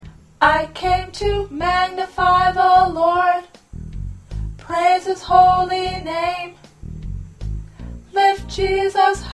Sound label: Alto, choir parts